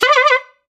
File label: Bycicle Horn; SoundsCrate